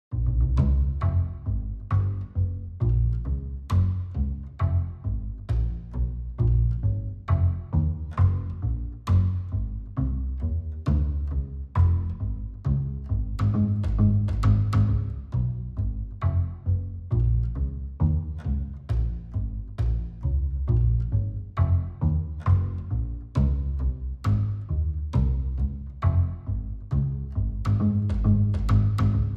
Bass

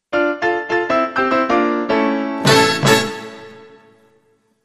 Sting

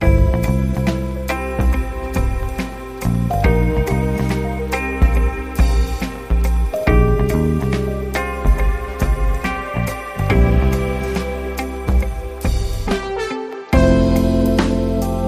Lofi Underscore

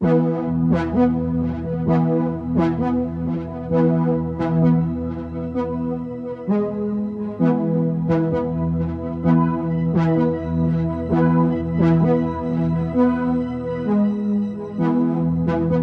Moog Bass

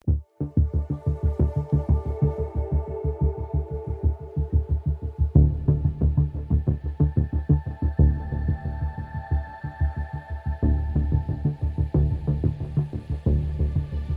No Electric Piano Mix

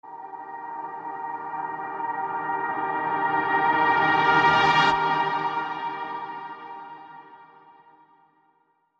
Light Reverse; Mountain Breeze

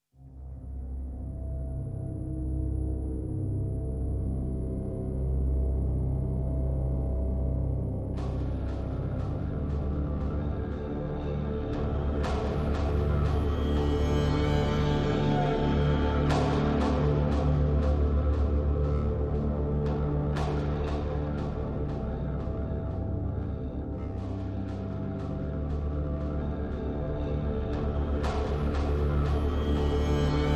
Dark Side, Drones Only

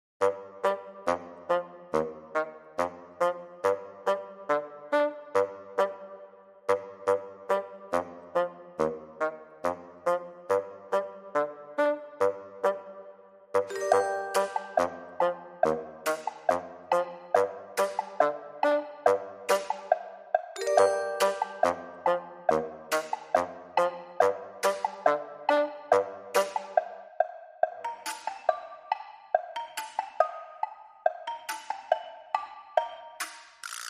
No Strings

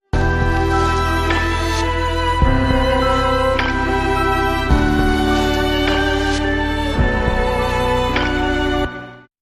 Sting

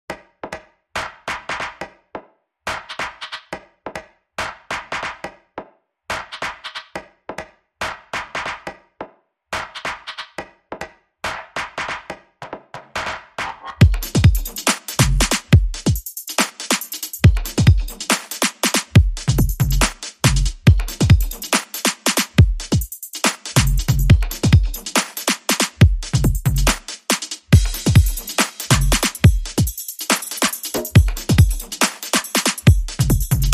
Drums Only

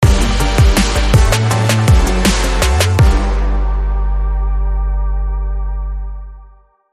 Brass Kicker

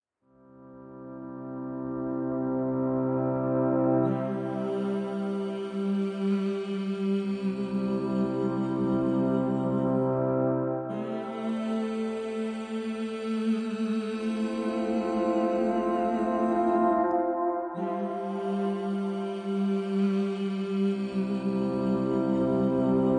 Ambient

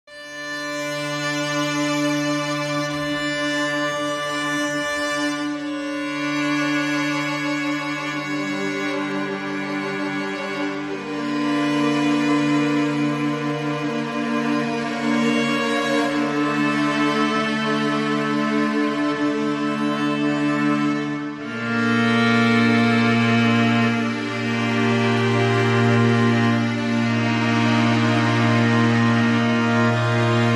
Strings Only

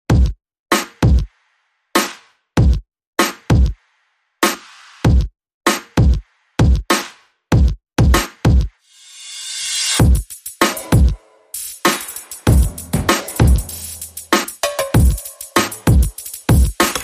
Drums Only

